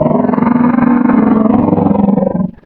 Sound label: combine gunship alert; hl2